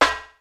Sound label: Lid Trash Can, Yamaha DD-35